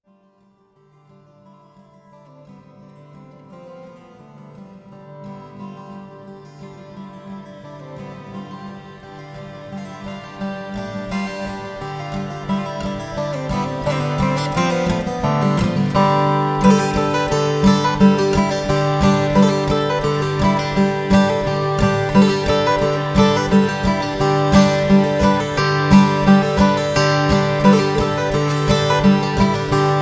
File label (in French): Gavotte